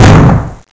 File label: mine explosion near; Bolo